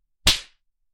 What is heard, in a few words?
Slap Face